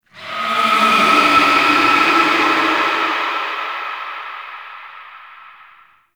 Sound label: Pain Verb; Warframe; Tau; Sentient Dactolyst